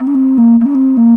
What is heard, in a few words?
moc li, MOCENO BASSFLUTE LICKS; PS-52 WORLD INSTR 2; WOODWIND AND BRASS